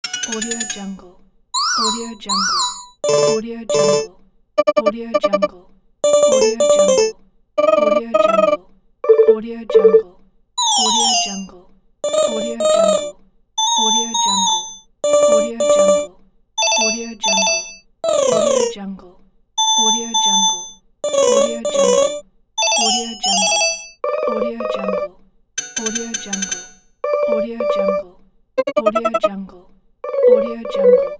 simple modern ringtones pack

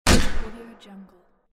short gun shooting

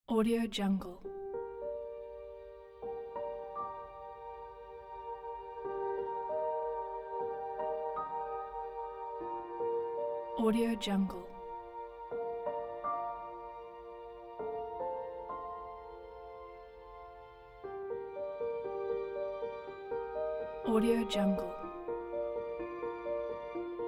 epic cello; andregaskins

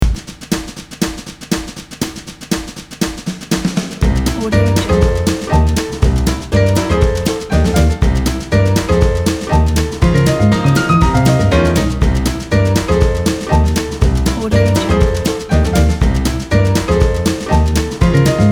jazz

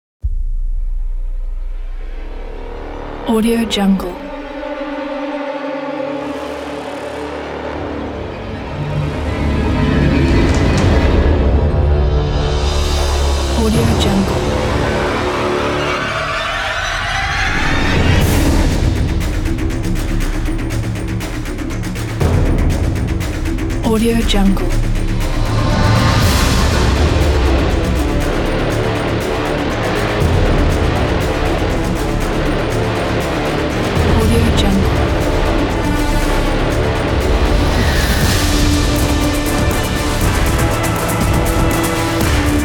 fractured action trailer

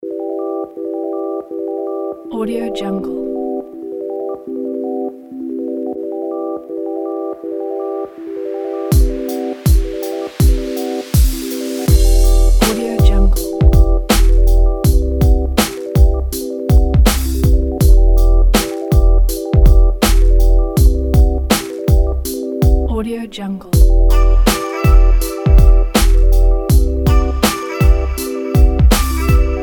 the vlog kit

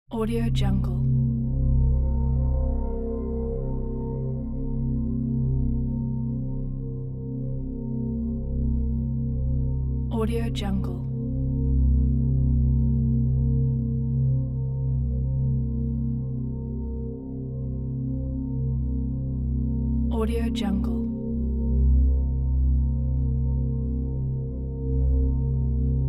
ominous soundscape

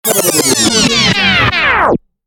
rewind dj

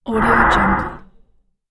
creature breath

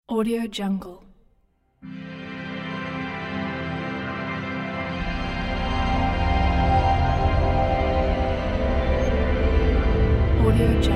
dark atmosphere

